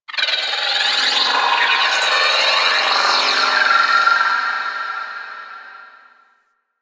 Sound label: Orphix Shield Pre Disable One Off